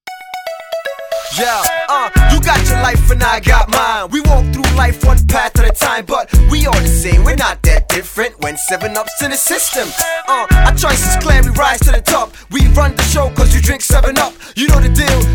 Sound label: Theme Jingle